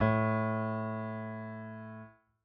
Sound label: piano a; Golden Crayon Sounds